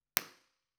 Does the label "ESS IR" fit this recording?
Yes